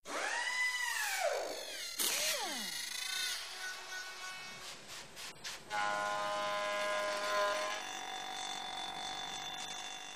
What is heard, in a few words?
Repairing Robot; Could Be Machine Shop, Air Guns, Good Reverb.; Cartoon FX